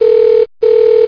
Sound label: ring; faxpool